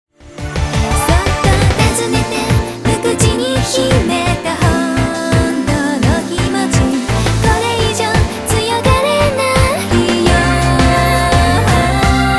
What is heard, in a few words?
chasse instrumental solo